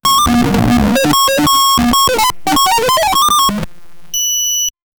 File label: mechanical digital system